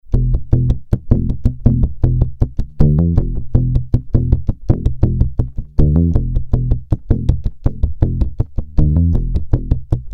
Bass Fretless Slap

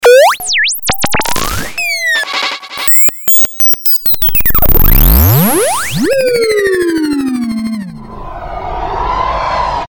Weird

